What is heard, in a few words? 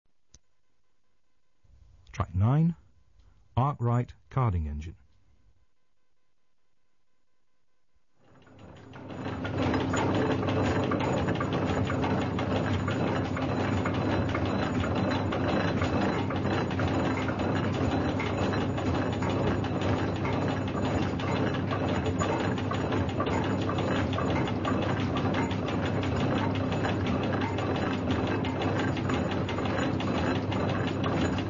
Arkwright carding engine; late 19th century mills and mines